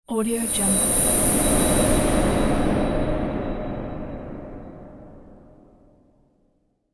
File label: space release swell; sound ideas